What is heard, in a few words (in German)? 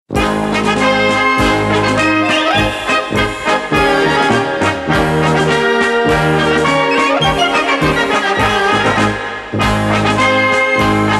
Bayerischer Defiliermarsch